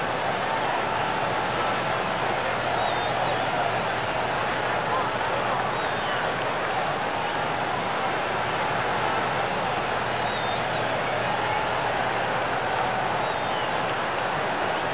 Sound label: loop, hockey, stadium; hockey95